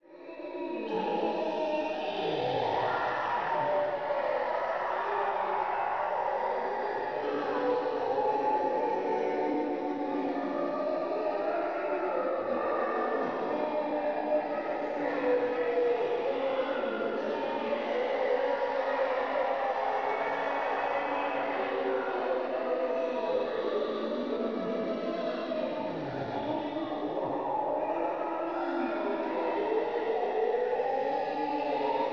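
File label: ambience moan group; subway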